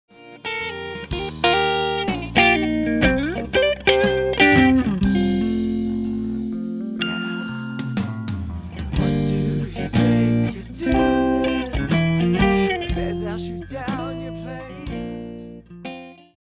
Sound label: Lead Guitar Boosted